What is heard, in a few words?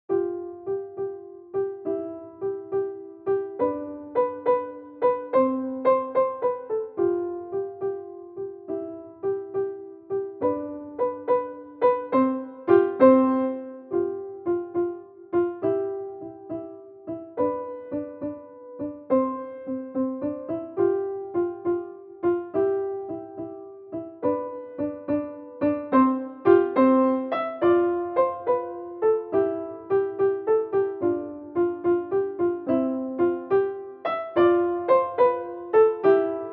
Chopstick Waltz, piano